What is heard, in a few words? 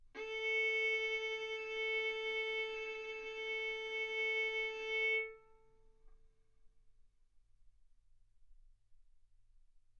Violin arco mf sul A A4 B4 stereo